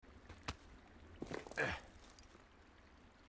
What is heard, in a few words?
player climbing up obstacle; firewatch